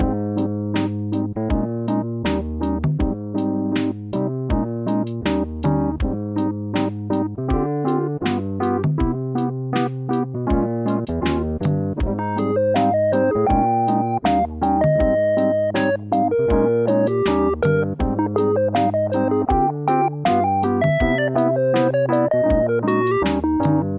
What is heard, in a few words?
loop; pseudOs